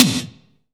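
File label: SDS V SNR, snare; Best Service; Real Mega Drums VOL-1; SDS V KIT 1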